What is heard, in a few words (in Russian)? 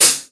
zakritij zvuk barabannih tarelok